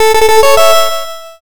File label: fanfare